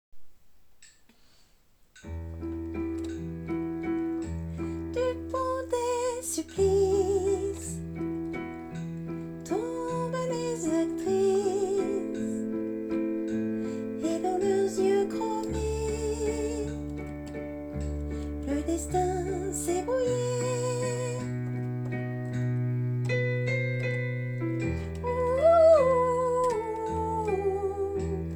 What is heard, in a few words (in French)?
valses de vienne tenor